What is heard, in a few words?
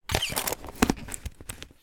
fish food flakes plastic tub lid close with jagged foil edges sticking out; animals